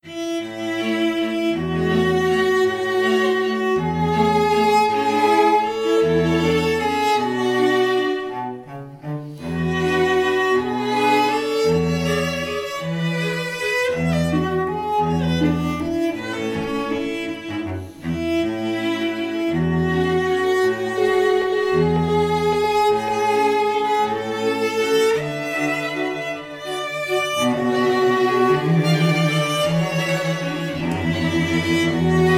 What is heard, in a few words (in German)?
Klingelton Cello; Android